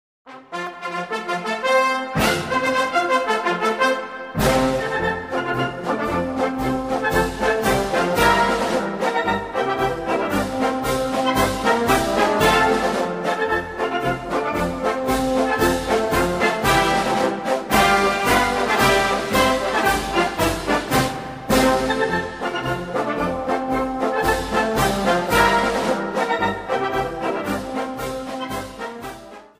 marsch

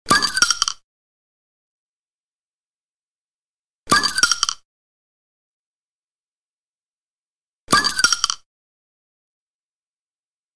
flaschenoeffner

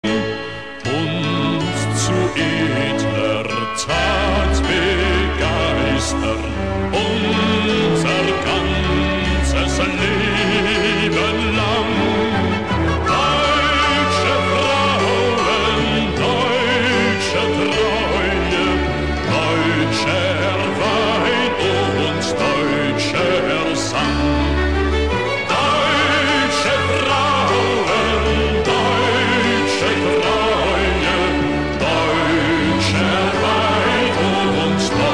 Deutschlandlied

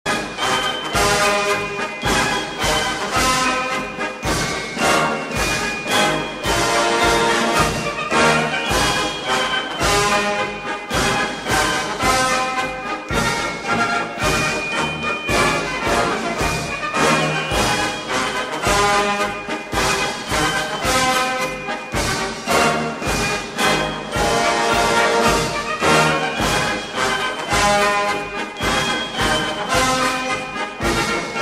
Deutscher Militarmarsch; Helenenmarsch

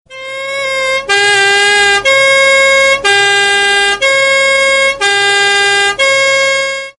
Polizeisirene

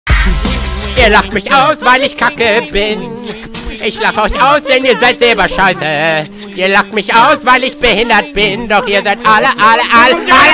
Klingelton

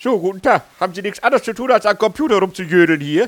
Deutsch rap

